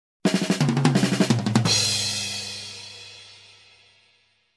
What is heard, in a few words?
Fast snare and tom fill